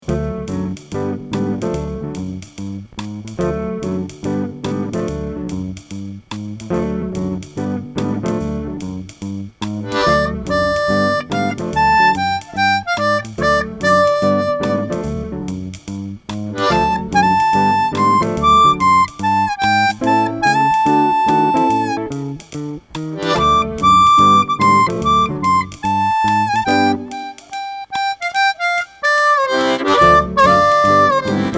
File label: harp